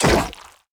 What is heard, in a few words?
enemy acid evolved attack